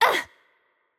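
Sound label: Hurt Light, German; bloom minigame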